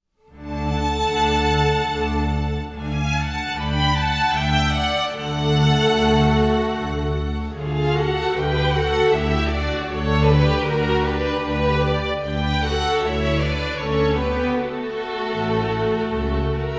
Chitarra Concertante Gitarrenkonzert A-dur; Giuliani

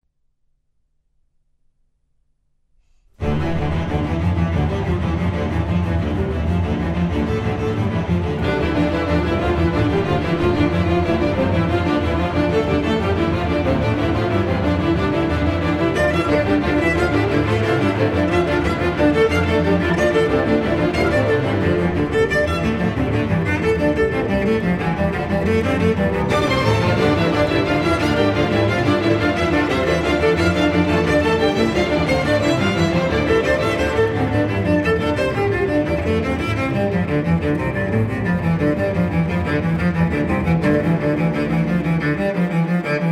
Concerto for Cello and Strings; I Turbulent, tense

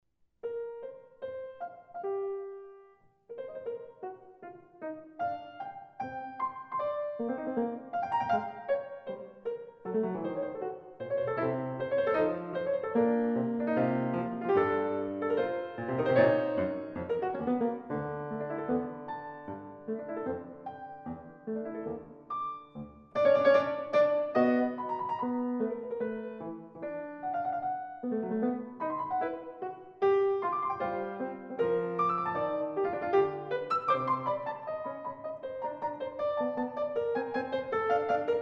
Fuga nona; Hindemith

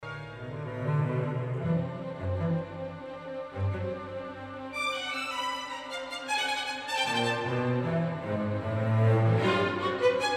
Symphony; Bajoras

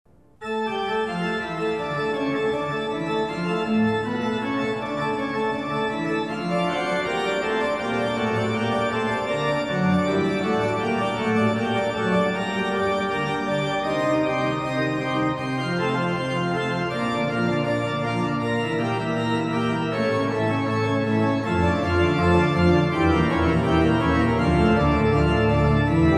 Fugue in D minor; Bach

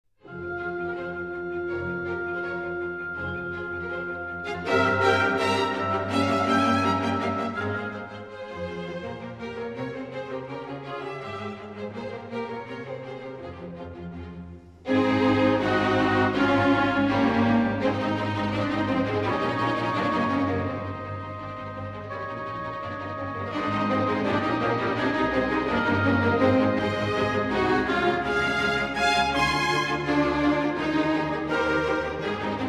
Symphony in F minor; Haydn

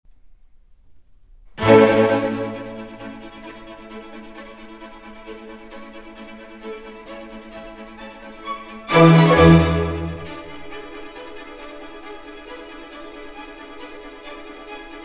Concerto in A for Violin and Orchestra; Henkel; Concerto No. 5

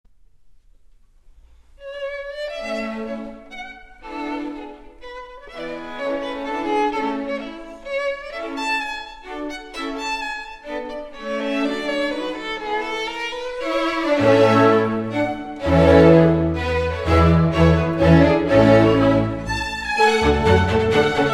Concerto in A for Violin and Orchestra; Henkel; Concerto No. 5

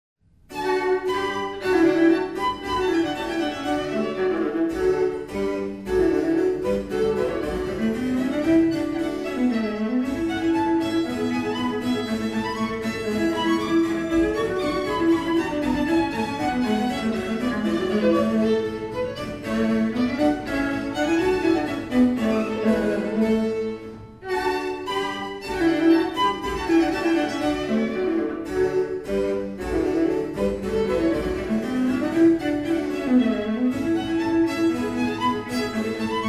Sonata D-dur; Leclair

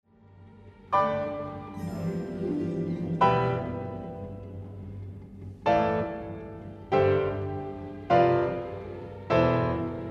Piano Concerto; Sodeika

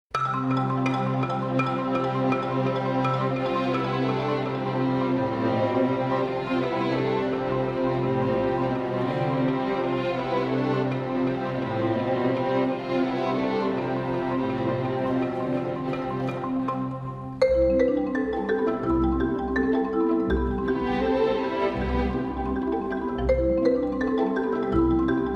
Concerto for marimba; Rosauro

